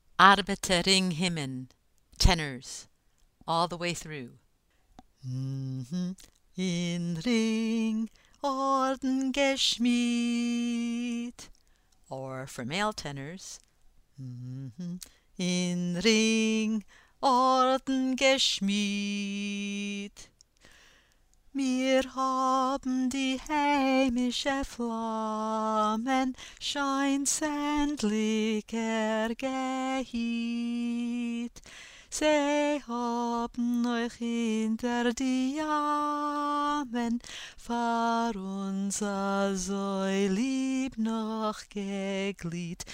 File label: tenor; AR Himen